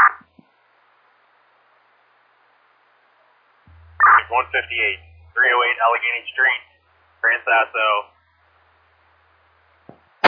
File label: alert tones page received